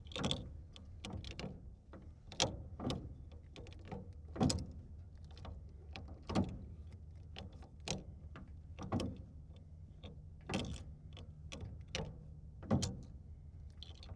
1937 Plymouth Gear Shift Dry, Period Vehicles

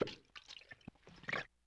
Plunger Ploink Water; Vintage Cartoon; Foley

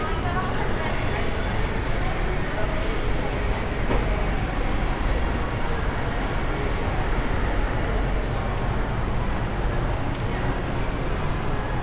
Shopping Mall Interior

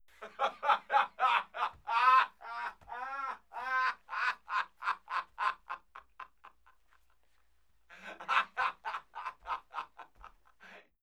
Laughing Male; Vocals & Wallas

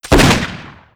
Rifle Shot; Weapons & Explosions

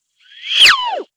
Wind Whistle Swoosh; Vintage Cartoon; Musical Elements

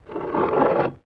Glass Slide Only; Vintage Cartoon; Foley

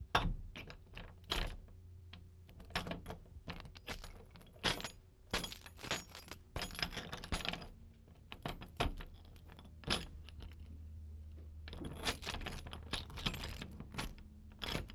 Keys Movement At Door; Vehicles; Doors & Keys

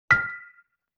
Xylophone Chord B; Vintage Cartoon; Musical Elements

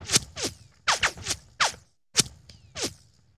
Bullet Whiz By Multiple

